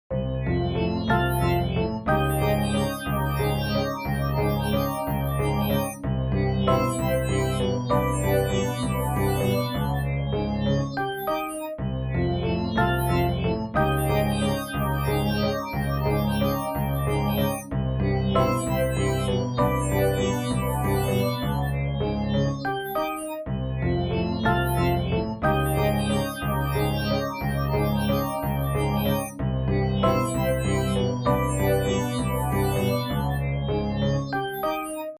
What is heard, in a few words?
piange loop one